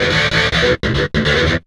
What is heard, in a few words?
GTR LOOPS